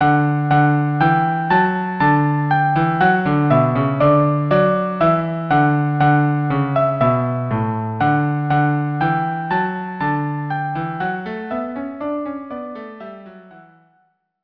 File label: ode to joy violin viola or violin cello; ode to joy from symphony no 9 violin viola or violin cello duo